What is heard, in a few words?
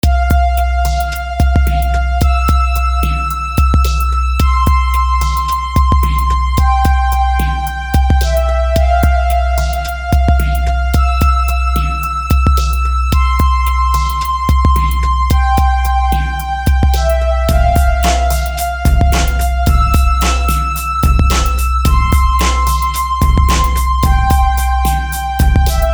Simple uplifting game music loop bpm 110